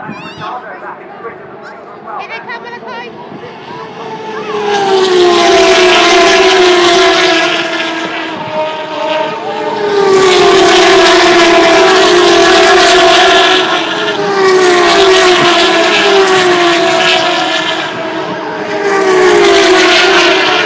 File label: distinctive drone of F1